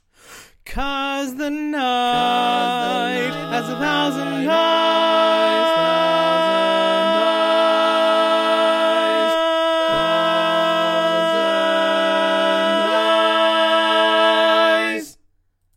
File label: Lead, barbershop tags